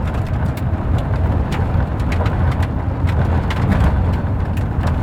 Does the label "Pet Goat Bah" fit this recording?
No